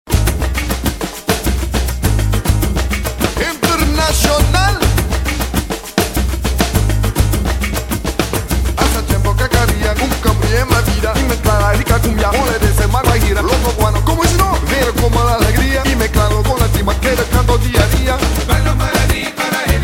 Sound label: cumbia reggae